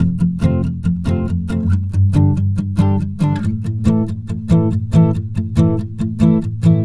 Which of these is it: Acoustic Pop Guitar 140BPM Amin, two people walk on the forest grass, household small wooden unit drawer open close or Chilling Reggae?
Acoustic Pop Guitar 140BPM Amin